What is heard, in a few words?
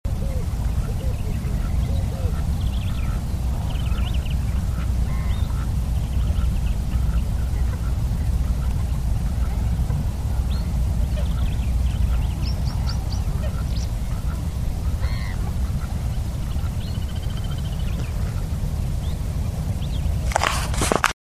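glossy ibis voice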